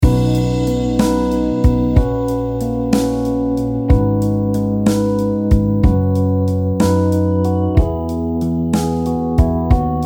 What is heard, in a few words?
Instrumental